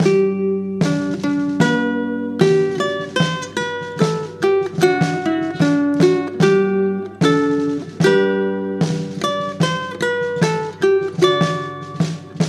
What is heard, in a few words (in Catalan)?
pifanos i tambores